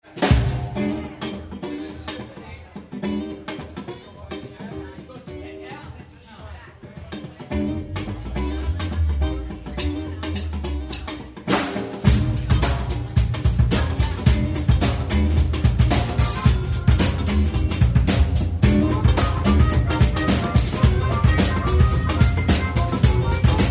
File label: Funk, live